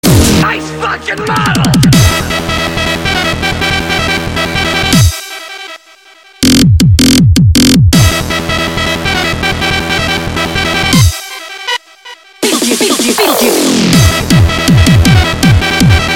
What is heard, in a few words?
Trance